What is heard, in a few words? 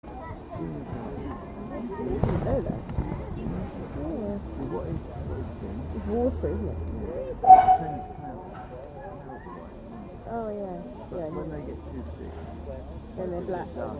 steam engine passes before we board the train at aberystwyth excitement is building